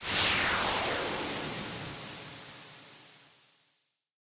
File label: dazzle continue button press; fortunerangers